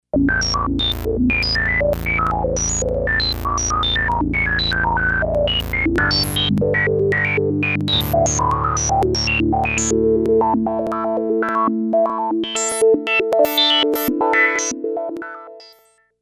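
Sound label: Sample and Hold Fifths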